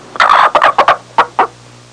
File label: Large bird sound